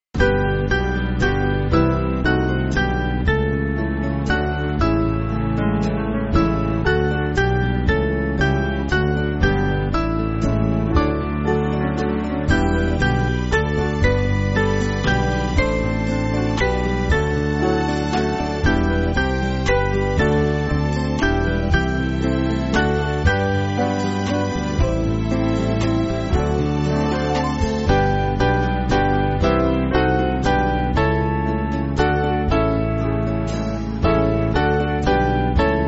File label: Band, church music; Herr Jesu